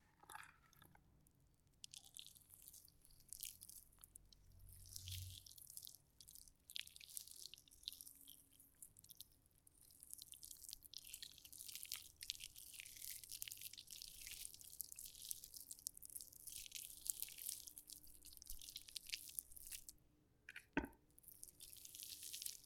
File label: Moving Meat